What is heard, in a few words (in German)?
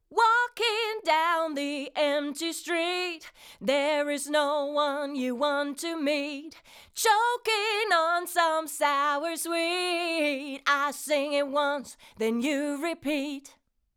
Vocal 3 zu 1 max. GR 8 dB AIR 2; Golden Age Project Comp 554 MK II